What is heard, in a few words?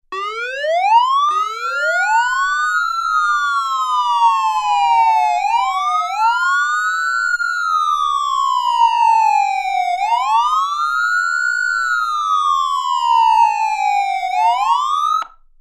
dual wail, siren; sa441